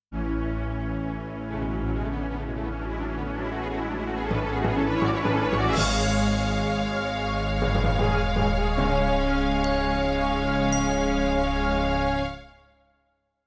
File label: SC880